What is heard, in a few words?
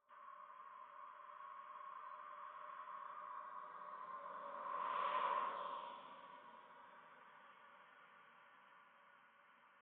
indoor Noise, Indoor Noise AVAS, electric vehicle